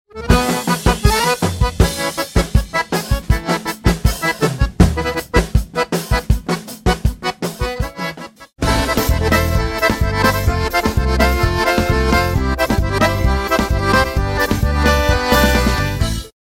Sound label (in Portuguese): loop sanfona batidão em qualquer tom; maycon gravacoes